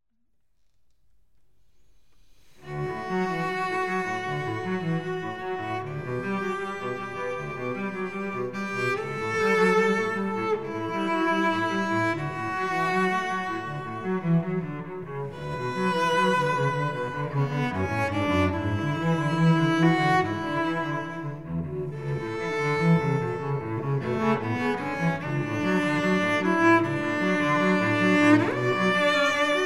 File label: Cello Duo ave maria